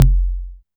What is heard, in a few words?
tom, Alesis DM5